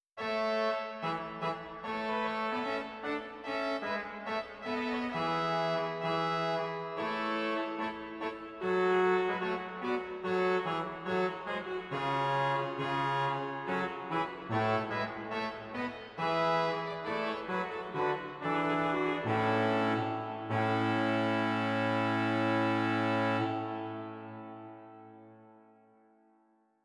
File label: Trompet 8; zwolle